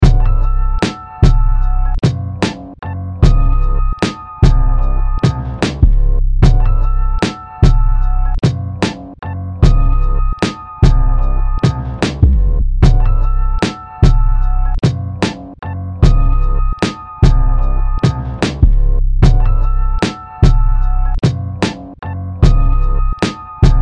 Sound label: Beat 75BPM